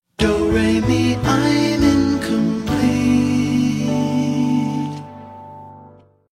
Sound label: do re mi